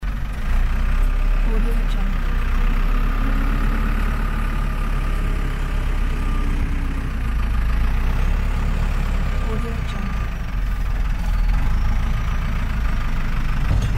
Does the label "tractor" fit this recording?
Yes